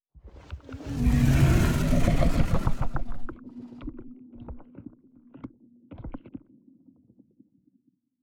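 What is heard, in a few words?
space dragon idle loop; Stellaris; reanimated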